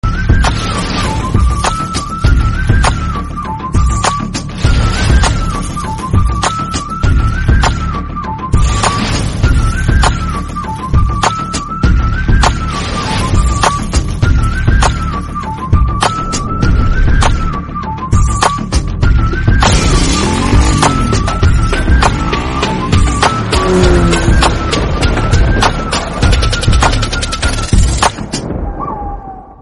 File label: instrumental song; valimai